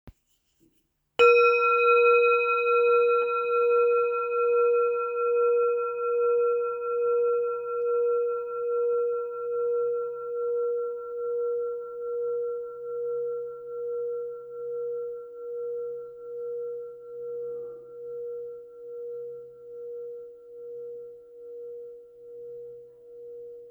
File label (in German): klangschale